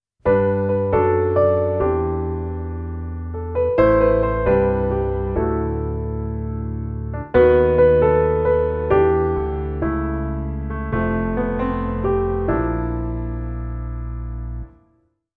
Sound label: No Guitar